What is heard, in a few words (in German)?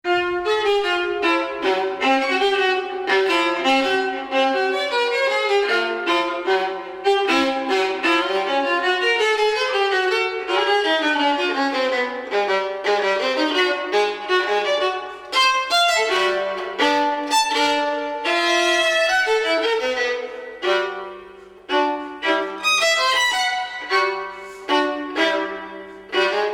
violine solo